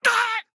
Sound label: town human road hit; Blind Drive